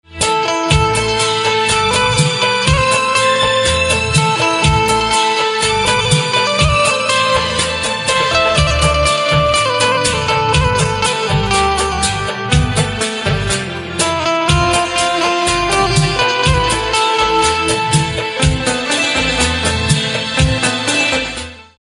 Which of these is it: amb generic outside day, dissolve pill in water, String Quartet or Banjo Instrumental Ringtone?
Banjo Instrumental Ringtone